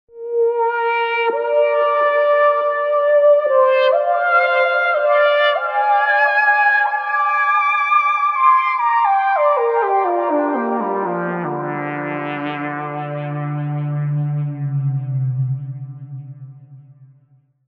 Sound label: Space Out; TURBO VL